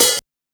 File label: Quasimidi 309, Drums